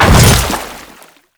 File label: Hammer Boss Land Water, Footsteps; Warframe; Grineer Hammer Boss